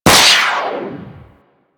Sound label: Skate Dash; Warframe; Corpus Modular Crewman; Skate Movement